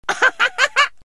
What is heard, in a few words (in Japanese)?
アハハハハ; Inai Inai Baa Oekaki Wanwan